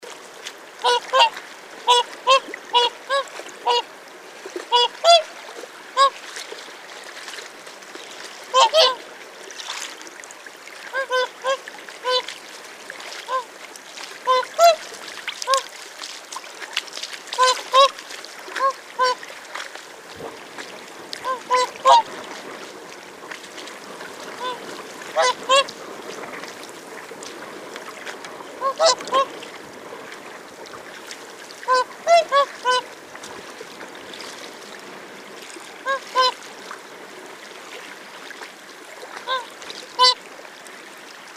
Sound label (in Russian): lebedi na vodoeme